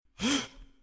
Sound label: gasp